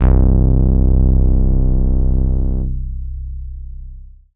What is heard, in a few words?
BASS